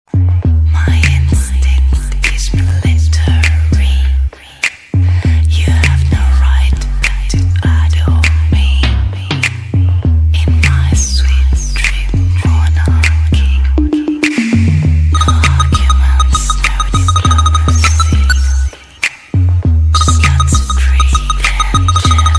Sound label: Bass Boosted